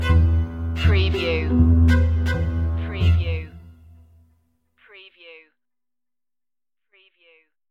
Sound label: creepy strings stinger